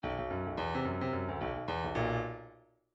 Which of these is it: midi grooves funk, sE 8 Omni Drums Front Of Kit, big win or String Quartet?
midi grooves funk